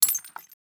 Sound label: glass hit